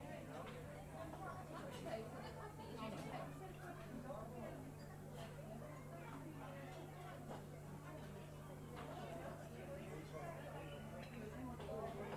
Restaurant

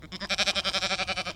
Sheep Bleats

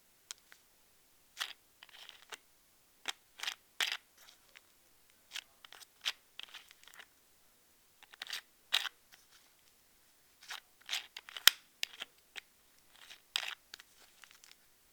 Bottle Cap Twist

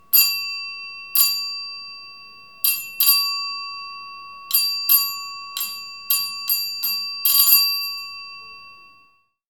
Electric School Bell Ring Reception Telephone

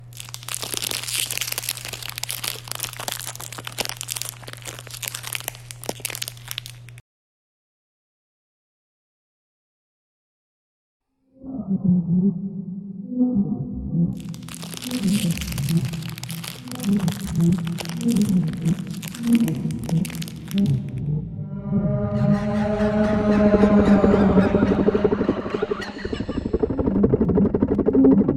Monster

